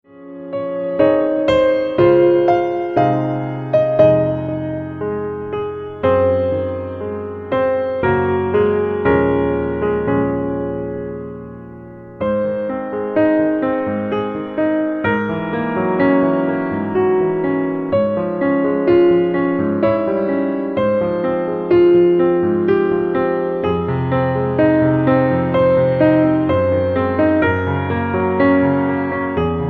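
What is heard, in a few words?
Abide With Me Tis Eventide, piano low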